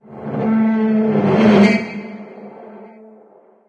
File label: metal groan